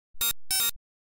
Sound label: Hologram interface tiny glitch